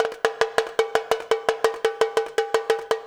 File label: FILL, Percussion